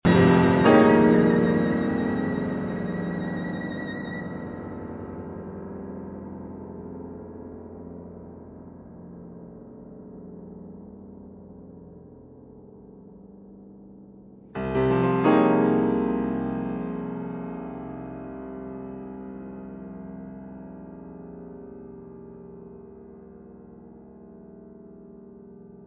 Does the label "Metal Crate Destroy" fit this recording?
No